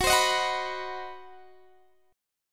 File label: C Minor Major 7th Flat 5th Gb